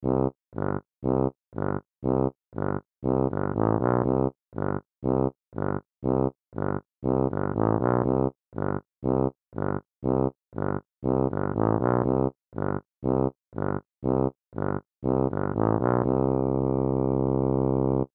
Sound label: March Low Musical; Cartoon Sound Design; Blastwave